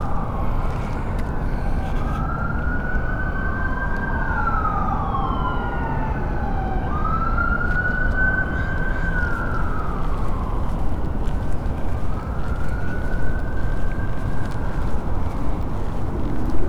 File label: sirens; Coruscate